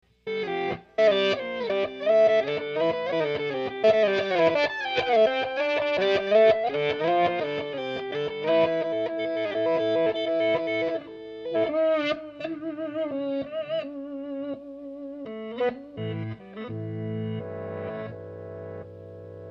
tape reverse

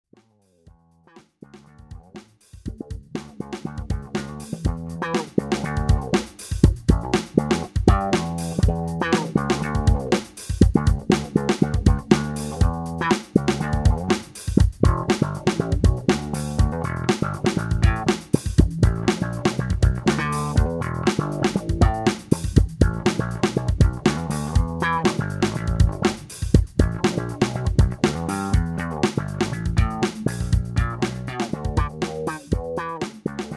Slap

